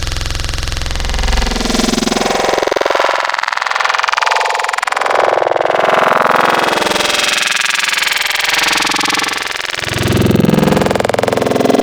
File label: Thin Throat, Growl Tables; Cymatics; Growl Tables Vol 3; Serum